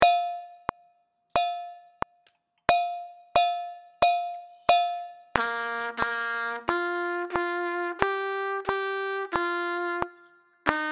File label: Mozart Melody Track